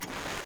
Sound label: Camera Swap